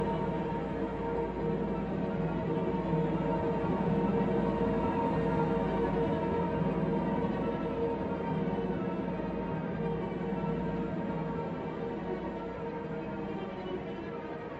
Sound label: Tension; Stage 02 Predator Hole Tension 05